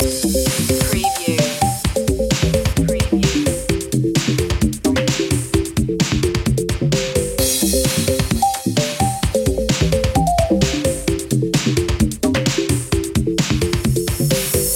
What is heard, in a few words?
electro cool loop; media music now